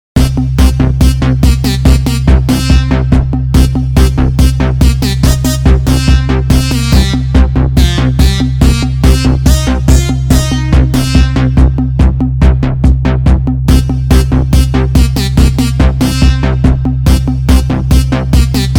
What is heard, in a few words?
POP